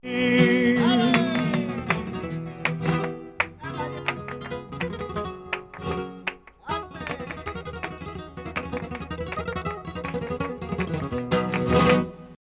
tango, flamenco

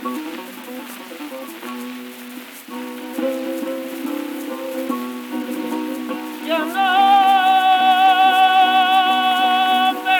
Tangos tientos, flamenco

